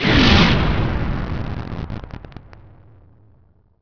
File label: tank fire; Emperor - Battle for Dune